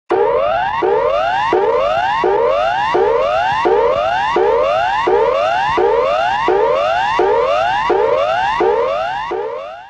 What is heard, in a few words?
Standard Emergency Warning Signal